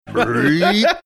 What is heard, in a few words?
imitating burp